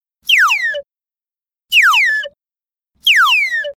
comical down short

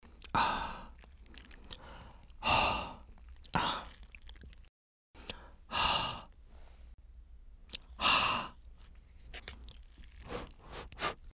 ramen ah

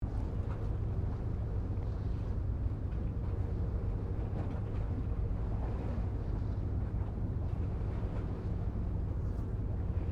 Isaki fishing port

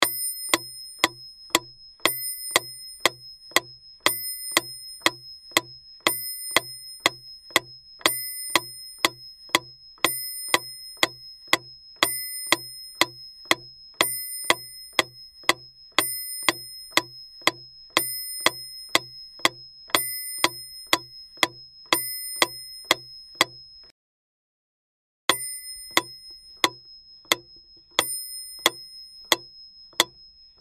metronome

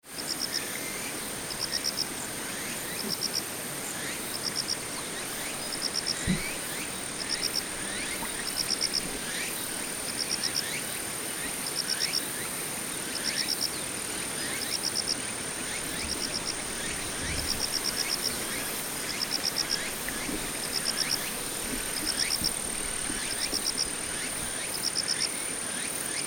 rice field

